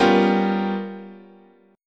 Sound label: G 7th Sharp 9th Flat 5th, chord, guitar